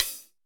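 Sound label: HH open; CYMBAL; drum; CYMBAL STUFF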